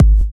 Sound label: Kick, Kickdrum; Equipped Music; Loungin House